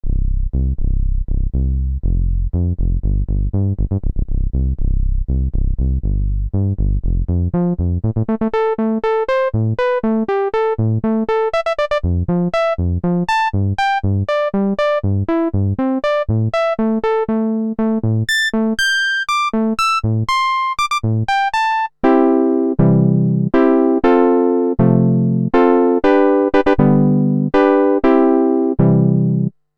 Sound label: oberheim matrix-6r filter lpf q000